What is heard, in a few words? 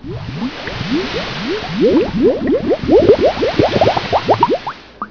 Sinking Ship; Ultima III; Ultima Sounds